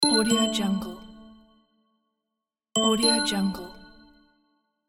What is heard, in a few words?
menu sounds